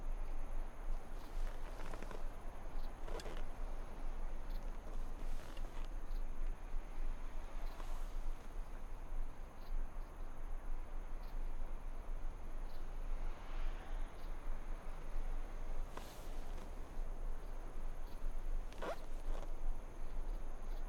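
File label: ambience menu loop; Blind Drive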